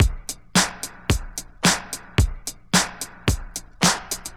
Drum loops Boombap

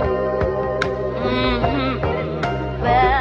voice and keys; Phonky

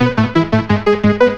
hurricane winds; Loops; Electronic

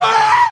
scream

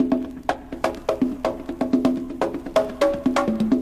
conga line, Drum loops

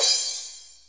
tangiers, Cymbals

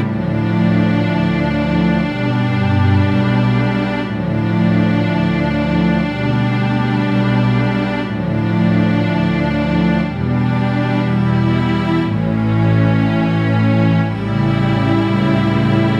strings, sad loop

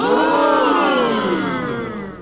"ooh", group; ooh ahh

